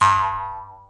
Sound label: boing